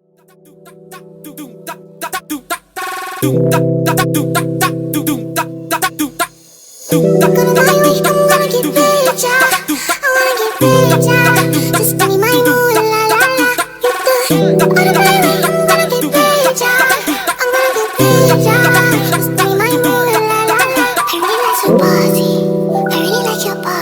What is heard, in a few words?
funk phonk